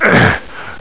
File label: pain; alienarena; doomunls